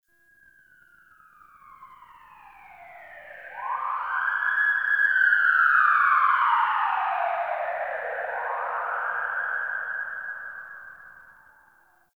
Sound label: Tunnel Distant Siren; Warframe; Ambience; Nineteen Ninety Nine